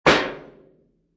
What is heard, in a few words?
Can Tin Place Down On Concrete, Foley, Can, Tin, Large, Filled With Liquid, Placed Down On Concrete; Set Down